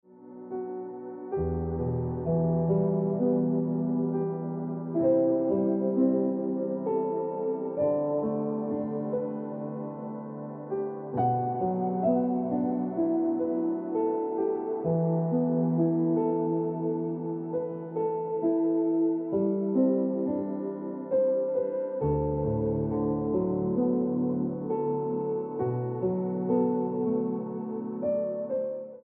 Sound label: Piano Music